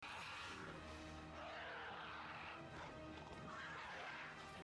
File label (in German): Ruf Dracorex